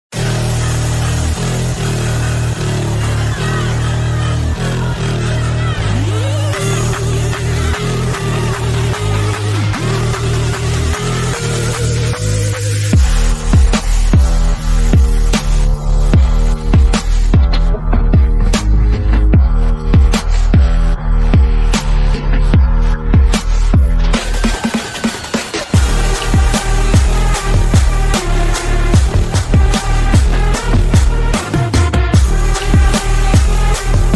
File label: official instrumental